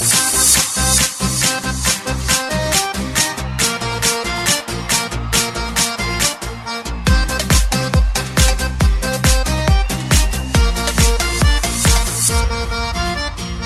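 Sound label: accordion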